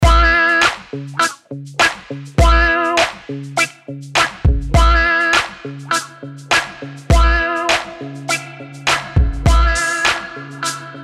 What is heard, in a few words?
Plate Reverb; MASCHINE STUDIO